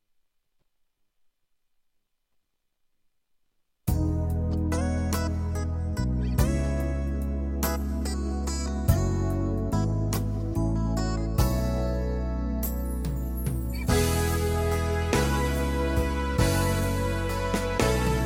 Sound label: tyros 2 Soul Style